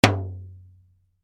toy drum hit; Music Production Loops; Miscellaneous Loops; McKinneySound

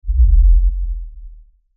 LFE Ultra LFE Hit, Lfe Ultra Low Bass Hit Impact

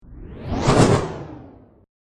Crazy Wave Attack, noise; science fiction sound effect

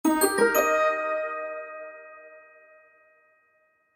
Cartoon Orchestral Major Arpeggio Ascend Musical

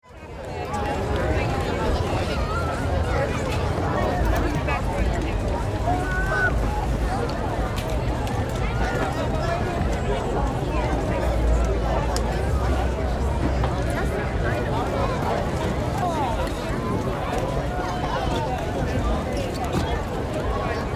Ambience Crowd Walla Outdoor Movement Walking; Crowds; SFX Bible

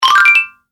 Award - Game Collect; Video Game Sound Effects

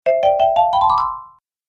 Happy Game Bonus; Video Game Sound Effects

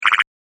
Froggy Laser, video game sound effect

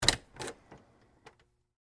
Ice Box Door Open, Antique; Household; Appliances

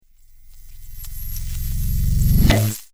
Science Fiction Magnet Attract Super Power; Electrical; Sound Design

